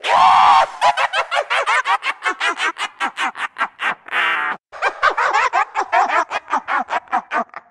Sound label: Witch Laugh